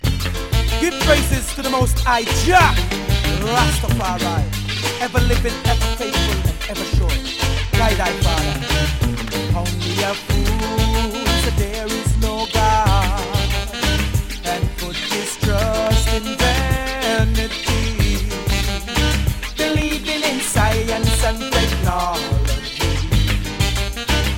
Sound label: twinkling sound riddim